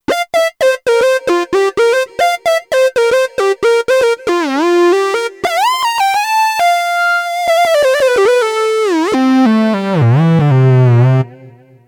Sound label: Lead, Kurzweil K2500X; Factory Programs